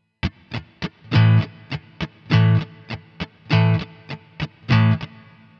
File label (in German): Schlagmuster Übung, gitarre